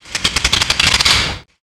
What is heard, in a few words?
Bizzare Fill, Drums & Percussion; Percussion Fills; FX; Sonic Foundry (Sony Creative Software); Futurist Drum & Bass